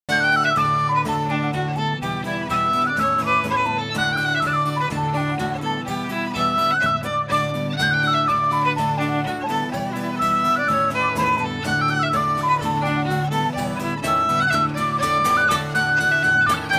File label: polkas